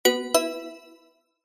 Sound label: multimedia alert notification mallet musical short positive attention